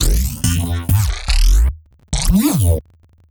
Sound FX Bass Shots

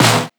Snare Dist; Dolfeus; pitchglitch

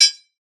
metal; foxdot default

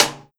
Perc Samba Caixa Rim Hit

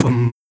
Beat Box Kick

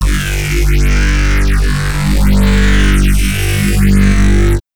Simple Growl Reese Long

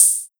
HiHat O2C TR606

